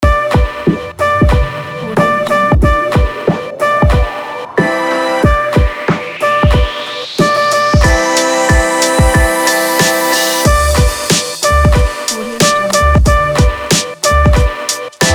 hiphop beat

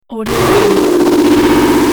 distorted glitch noise

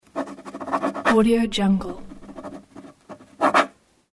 pen signature

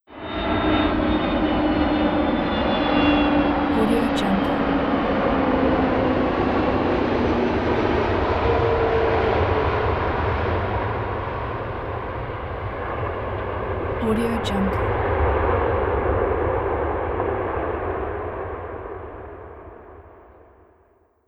plane arrival

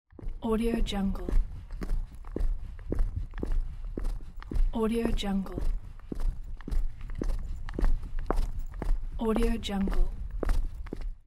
footsteps by the asphalt gravel or sand

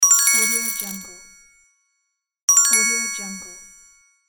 win coins

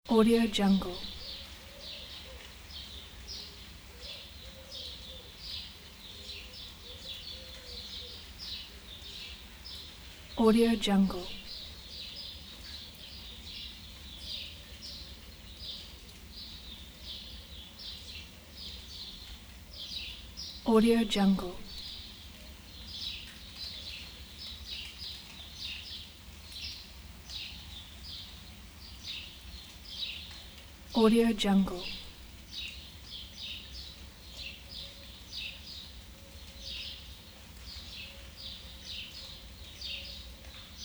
birds in spring

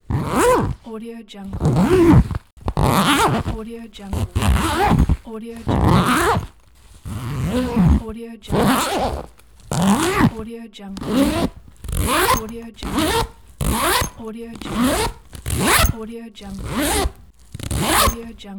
zip; kudlaproduction

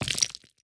fantasy game material stone pick up

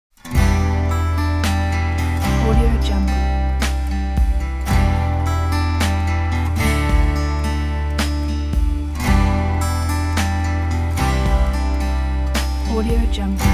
ambient acoustic guitar

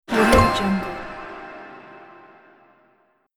treasure prize revealed

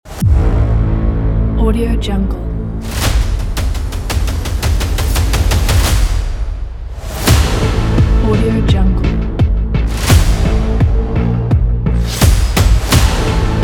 action game trailer

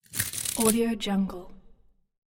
objects household brush handle; blazznet

